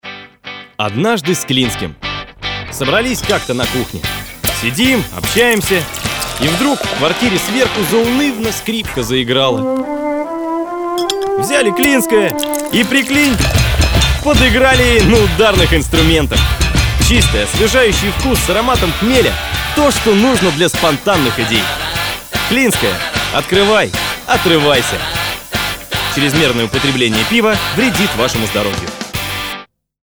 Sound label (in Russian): skripka; klinskoe; radioreel